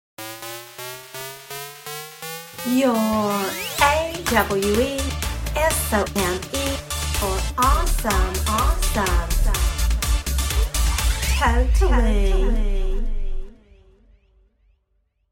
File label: surprise jingle